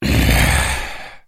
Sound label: zombie